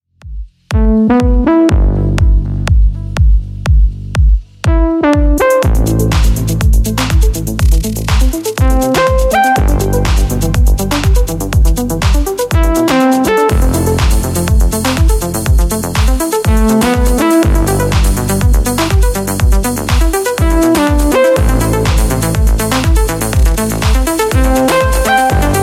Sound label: melodic techno presets; production music live; serum presets